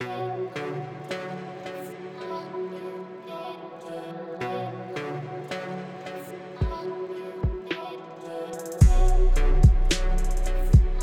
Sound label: Vocal Pack Sample Beat